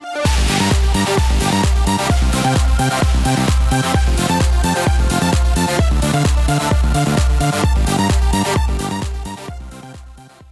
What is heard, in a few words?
win, music